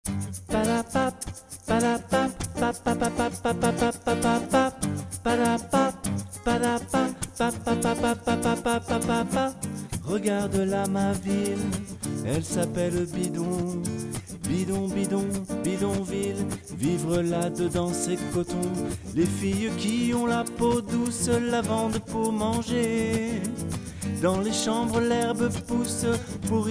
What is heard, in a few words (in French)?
bidonville lead, groupe vocal; Voix / Lead